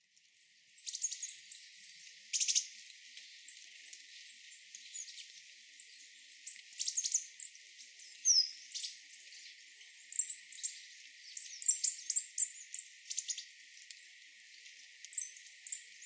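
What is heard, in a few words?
Coal Tit; higara; utsunomiya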